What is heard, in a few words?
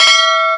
bonus chance; games; Admiral Nelson